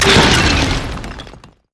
death; LoL; dinosaur chogath

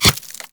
volley; LoL; ashe

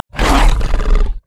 swipe efforts; nidalee base

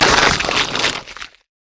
feast; LoL; dandychogath

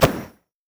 cryophoenix basicattack; LoL; anivia

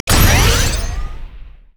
dash; LoL; vi

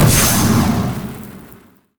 emote death; quinn phoenix

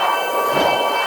trinket lens loop; LoL; items

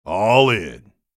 spellcast effort; Graves; Character Voices - English

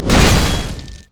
twinbite human; LoL; shyvana

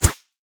basic attacks; Zyra

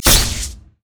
manta strike; LoL; fizz skin04

